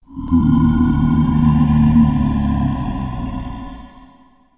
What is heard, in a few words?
mummy; tsm pyramids